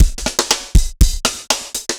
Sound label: dance floor tight 120, loops